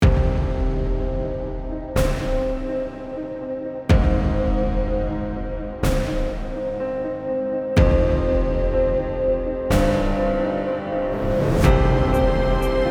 EPIC TRAILER

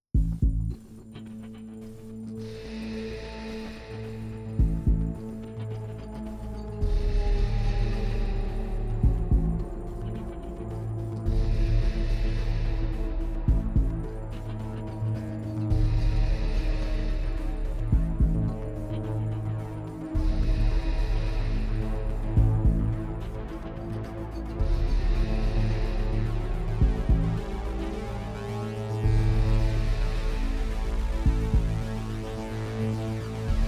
SUSPENSE TENSION Thriller crime

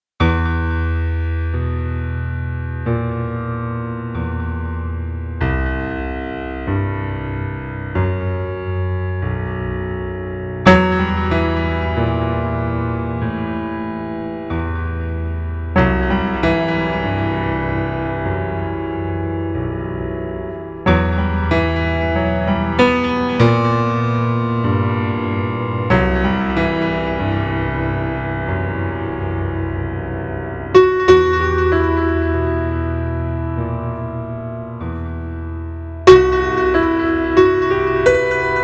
PIANO

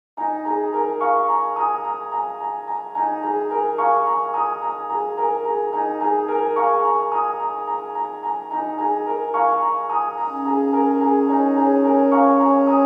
PIANO

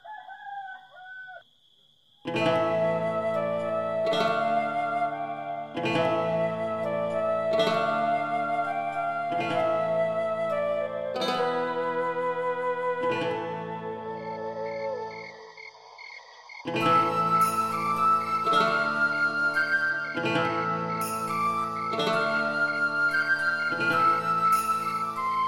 Troubadour Luth Flute

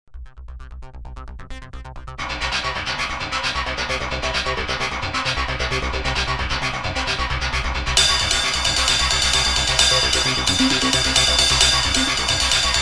Run n Bass

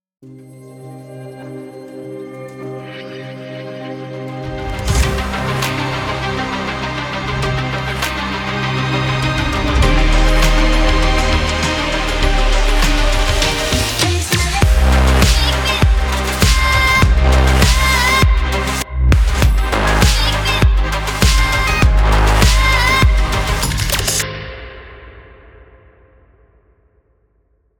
ELECTRO

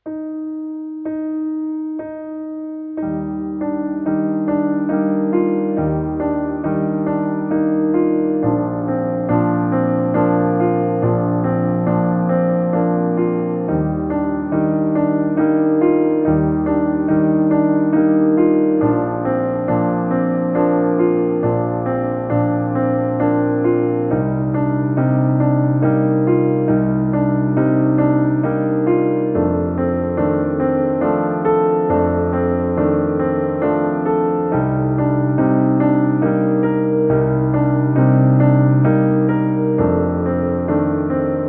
PIANO

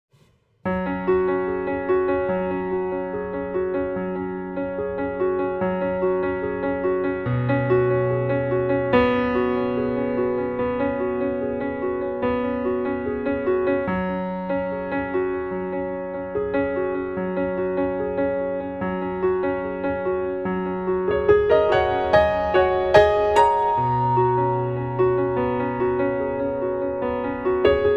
PIANO

